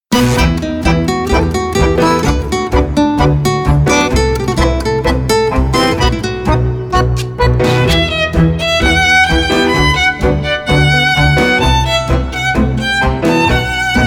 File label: tango ensemble; prandi sound